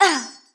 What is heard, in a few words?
djinn hit; DAoC; Toa